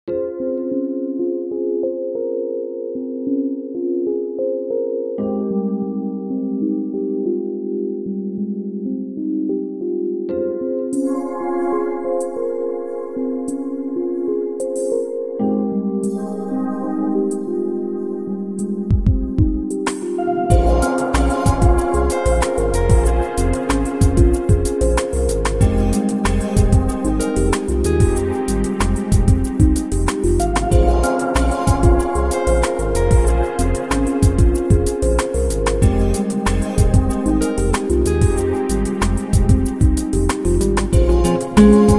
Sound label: Chillaxing Jazz